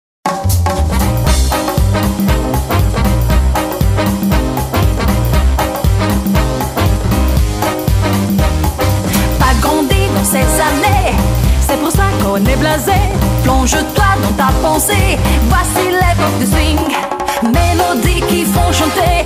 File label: JAZZ